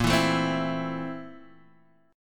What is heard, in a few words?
A Minor Bb, Am/Bb chord; Am/Bb strummed; JGuitar